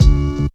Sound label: Bass Noize; Zero-G Jungle Warfare 1, 2 & 3